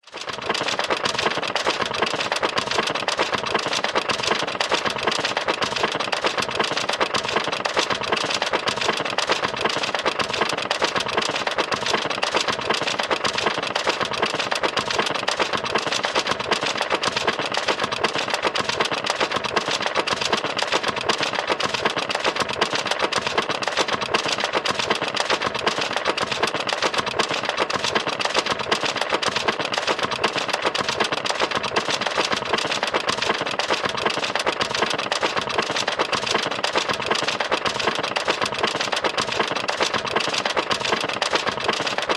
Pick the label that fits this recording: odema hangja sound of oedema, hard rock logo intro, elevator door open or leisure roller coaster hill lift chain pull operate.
leisure roller coaster hill lift chain pull operate